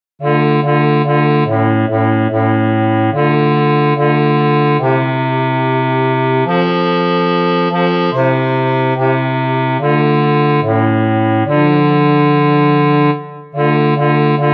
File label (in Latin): Misericordias Domini BASSI